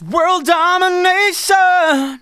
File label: vocals sung F#; Techno Trance Essentials